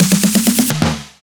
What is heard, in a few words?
Fills 128BPM, Clubsounds